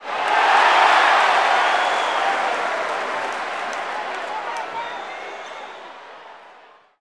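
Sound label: Basketball Crowd Cheer; SFX Kit; Crowds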